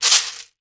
Household Shaker, household rhythm samples Hits

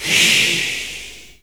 whisper ee; Spectrasonics; Heart of Africa 1; WHISPERS

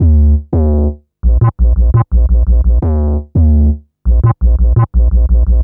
Psycho Bass; Drum & Bass; Fluid Dynamics

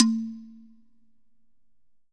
Slit Drum; A3; Drums Percussion

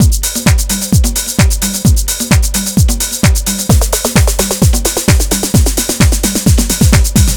House

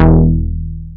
BLACKMOOG; Rubber Chicken Software Sample Bank

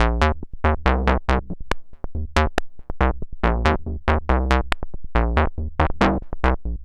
synth 140 crackle; Transmission-X

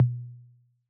Analog Tom; Yamaha DJX-2B

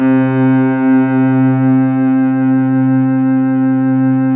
CELLOS C3

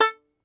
Tenor Banjo, Plucked Strings

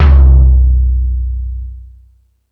Thundadome, E-MU Pro-Cussion

